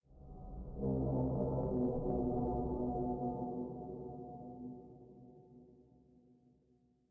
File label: sting metal groan; Rust